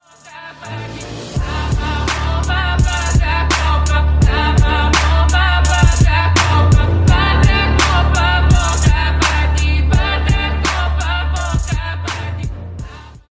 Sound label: Bulgarian Trap